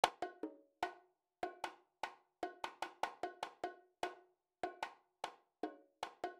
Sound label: Bongos, 075 Bmin, Hip Hop Exotica; Apple Loops; Big Fish Audio